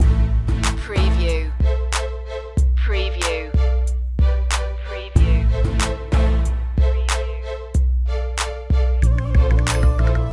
cairo electro loop

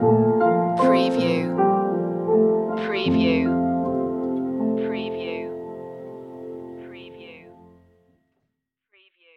slow summer stinger

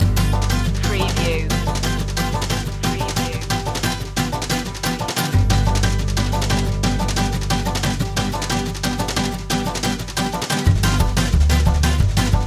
hickabilly hoedown loop

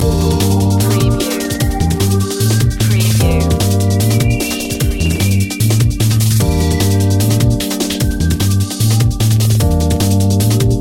xpress loop

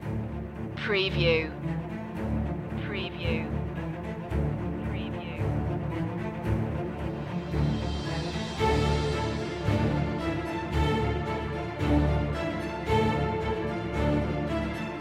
no choir intro

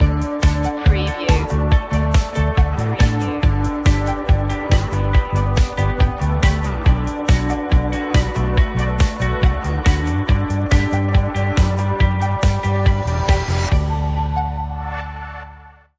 bright sky

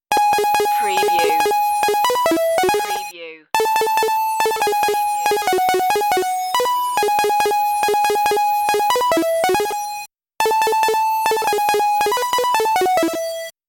8bit jingle bells melody

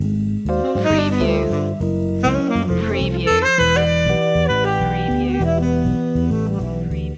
groove loop

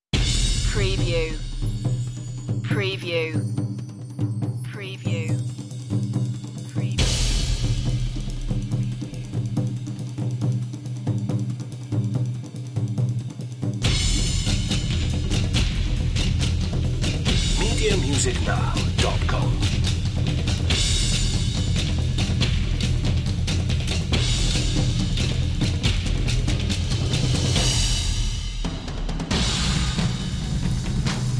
marching percussion only version

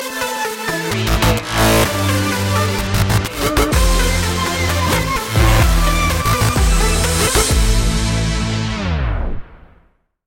dubstep stinger

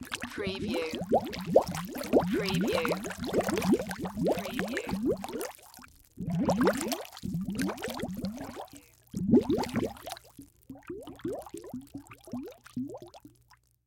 Submerge Container

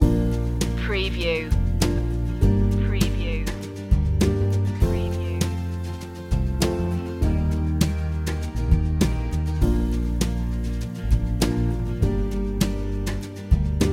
inspirational heart loop

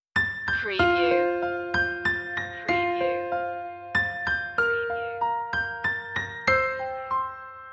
the first noel piano loop intro; media music now

